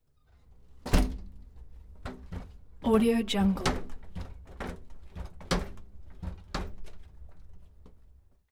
train metal drop floor; collanderson

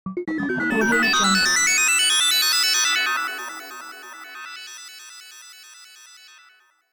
futuristic feedback

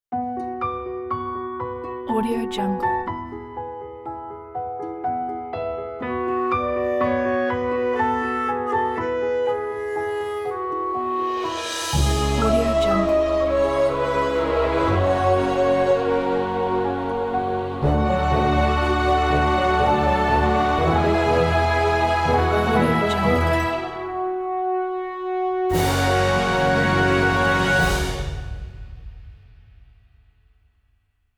fantasy logo

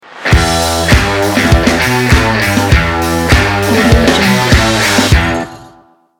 upbeat rock logo

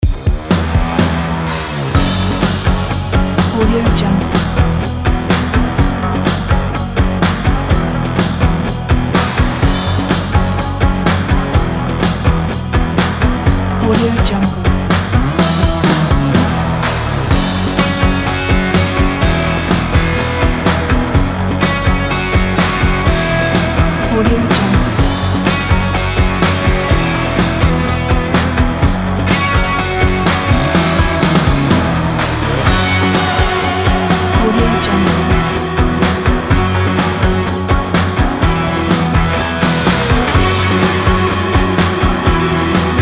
rock news

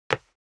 step dress boots wood parquet slide short; foley; smartsoundfx

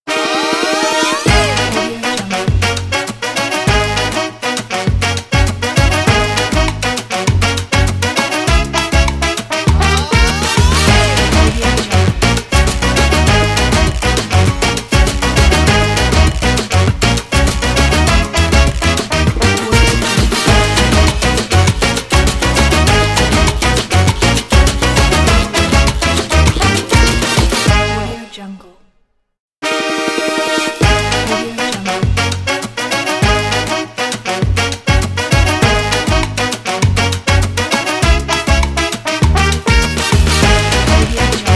cuban latin dance logo

